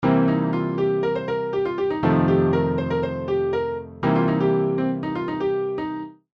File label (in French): pentatonique mineure sur accords majeurs